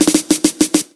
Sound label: ragga roll, drum loops, rolls